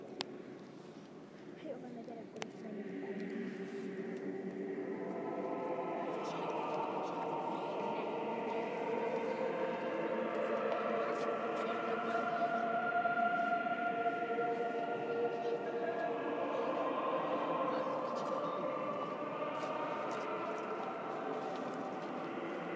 choir practice at St Peter's Basilica Rome recorded from the dome